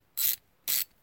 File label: Ratchet